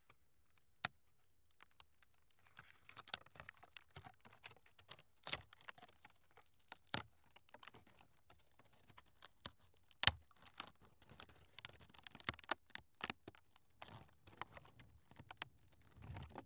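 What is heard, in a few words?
cracking bark of a dead tree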